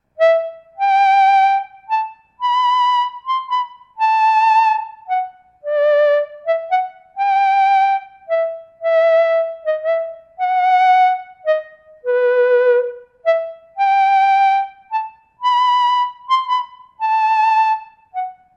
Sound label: green flute